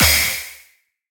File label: normal hit finish